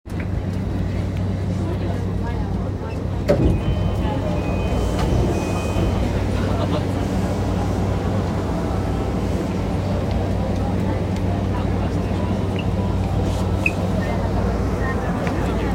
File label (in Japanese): JR山手線目白CCW